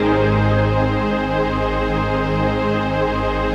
CHRD PAD; Best Service; XX Large Pads; Sample CDs

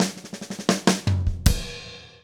DOODLE, Drum; Steve Gadd Drumscores; GEMS; LICK GEMS

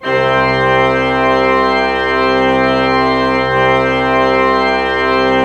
Orgue Eglise Full C4, Pipe Organ Full

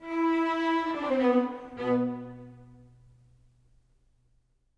Cello Viola, Orchestral; Sonic Foundry (Sony Creative Software); Orchestral VOL-2; Modern 02

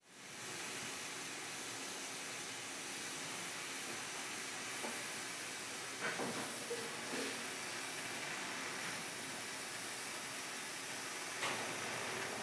Ambience, Construction; City Sounds

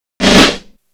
Fill, Snare Fills

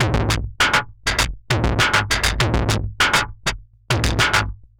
perc 100 stomp, Percussive Loops 100 bpm; Sample Magic - Transmission-X

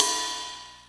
Korg N5 Rock Kit C#3

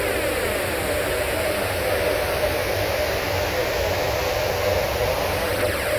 Panned Noise, non tempo loops; stereo toolkit samples

